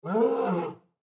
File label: Lion Moan Long; Big Cats; Animals; Blastwave